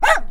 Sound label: bark